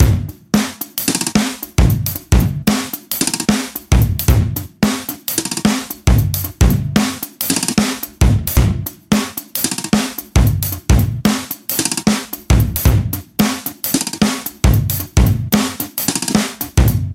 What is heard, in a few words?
loop 112 bpm; CanBounce